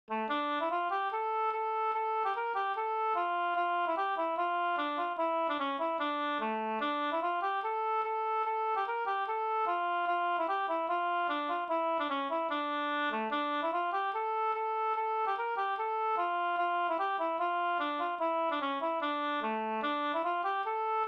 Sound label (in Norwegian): reinlender